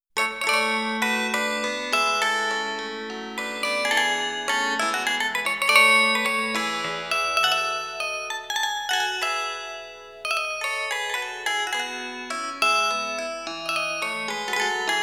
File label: Fanfarinette; Rameau